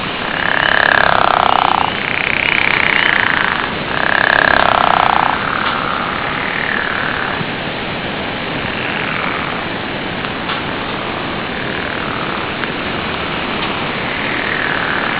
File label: pickerel frog